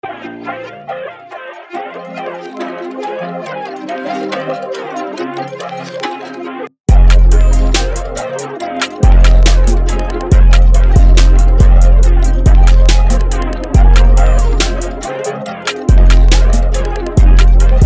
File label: Goose 140 BPM; flipped